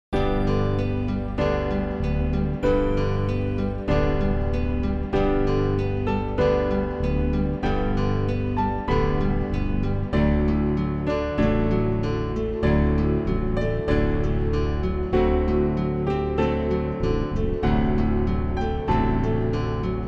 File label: backing; New Hope Music